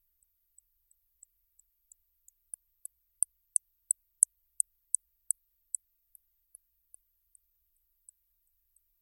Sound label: bat